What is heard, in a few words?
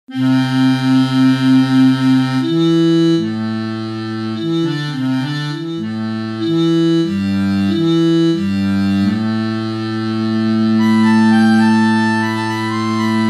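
MicrOrgan short closed dry, Reeds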